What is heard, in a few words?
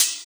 Open Hhat, HiHat; Equipped Music; Loungin House